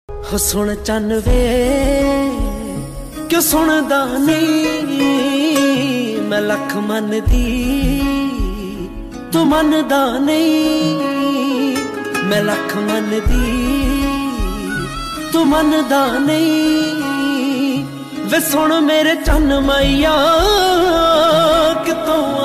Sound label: sad punjabi ringtone